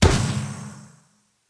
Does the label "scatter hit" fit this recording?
Yes